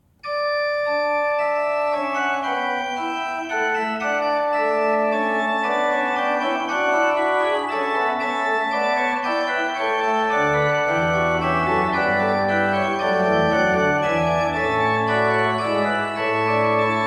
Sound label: pipe organ; Le Raphael Multiplex